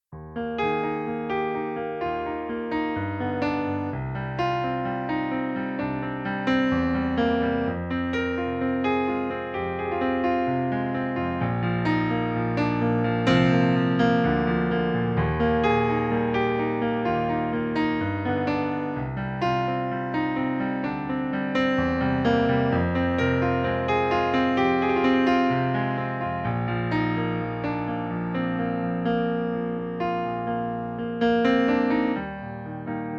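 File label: piano playback Eb major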